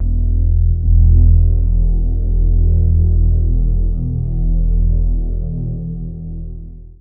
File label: Guitar Textures